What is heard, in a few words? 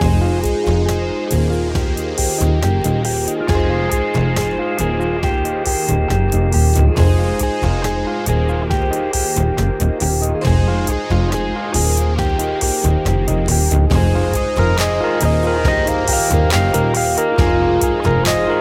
Chill Vibes